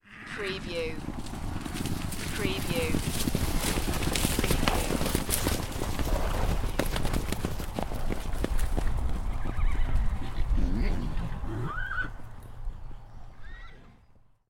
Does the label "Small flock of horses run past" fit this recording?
Yes